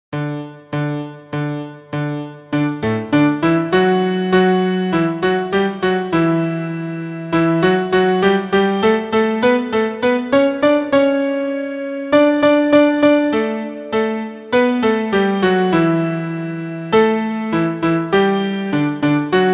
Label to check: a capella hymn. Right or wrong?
Wrong